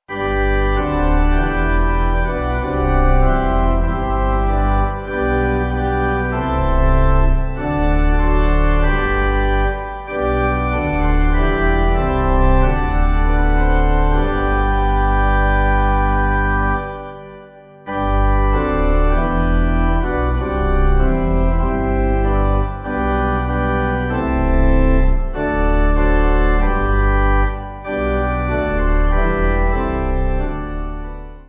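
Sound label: pipe organ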